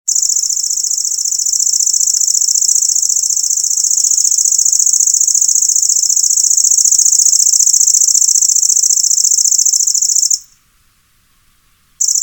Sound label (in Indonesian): kecici lurik